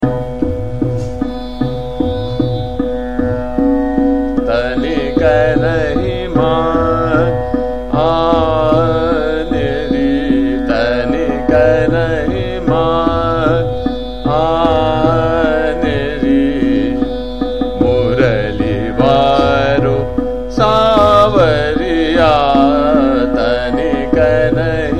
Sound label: Chota Khyal - Trital, Jaijaivanti; Tanik Nahi Mane